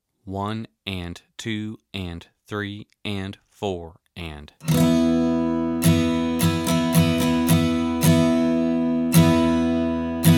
Power Chords Open Strings

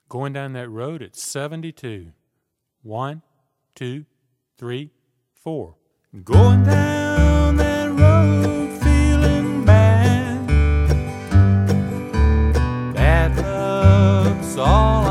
72 Bpm; Going Down That Road

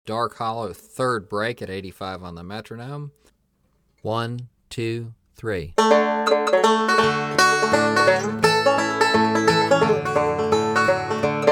85 Bpm

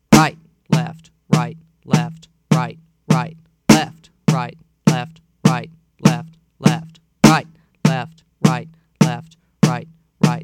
Rudiment 5 Fast

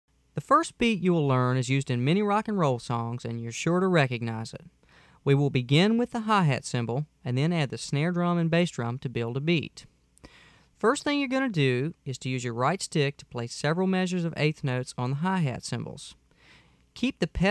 Beat 3

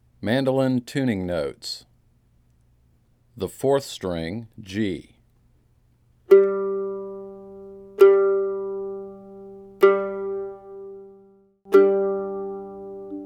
Tuning

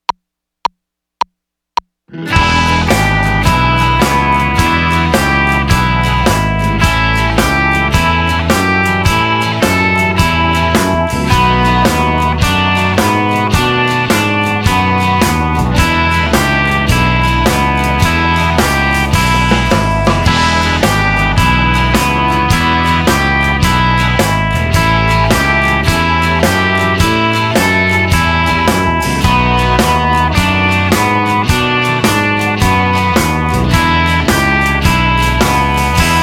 Blues; Onto Blues

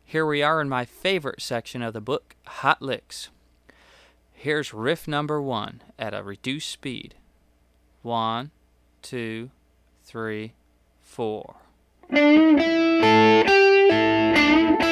Hot Licks Riff

